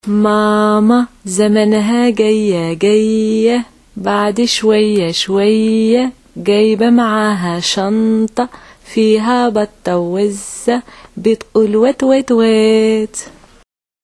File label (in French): Mama zamanha gay comptine arabe